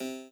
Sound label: chord